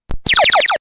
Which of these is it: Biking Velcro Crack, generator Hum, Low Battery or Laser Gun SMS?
Laser Gun SMS